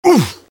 sniper pain sharp; vo

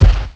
large steps; the monster plane; kolmods steps

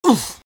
pain sharp; garrysmod; tf2-content-gmodcontent

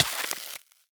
freeze hurt; cauldron frozen lava pack

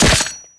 cleaver hit; tf2

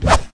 swish; brainrot; bri bri bicus; zombs

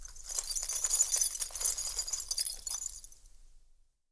walk; the monster plane

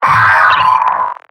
pyro pain sharp; vo; tf2; mvm

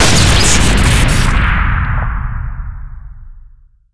sniper railgun charged shot crit, weapons; tf2

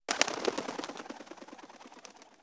Fly Away; brainrot; spiuniro golubiro

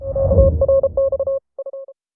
download complete; tf2; garrysmod

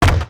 giant scout step; Garry's Mod; tf2; mvm

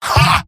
laugh short; mvm

